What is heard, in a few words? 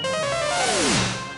Spell - Poof; DQIV iOS SFX